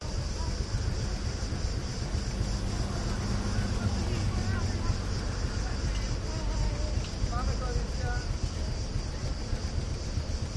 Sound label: athens side streets weekday evening; veikou; your free sounds